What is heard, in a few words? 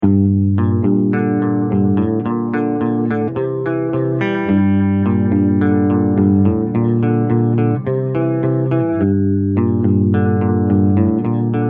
Light Chorus